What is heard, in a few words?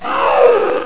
growl